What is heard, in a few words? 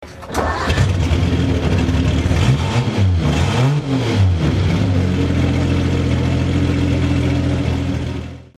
Alfa Romeo 6C, oldtimer; Jankovitz